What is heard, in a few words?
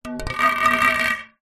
tin small empty no lid down on hard ground; zapsplat